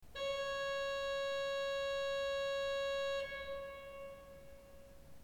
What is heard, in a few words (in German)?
ton, orgel